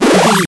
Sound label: retro powerup item